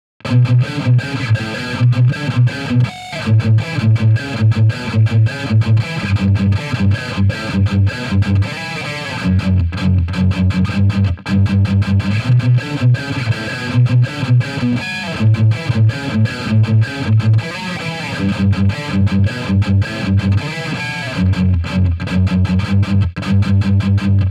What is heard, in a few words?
distortion, gtr